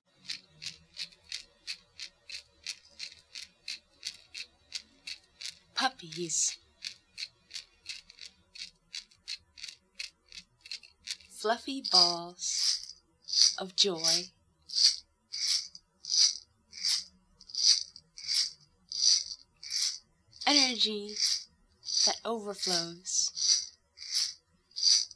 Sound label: puppies flute haiku